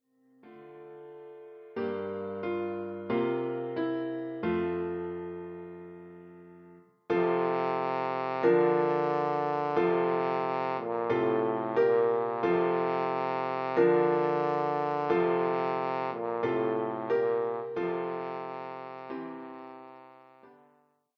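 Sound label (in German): O du froehliche, Horn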